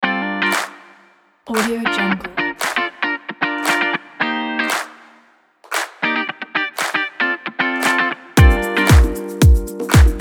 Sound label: upbeat disco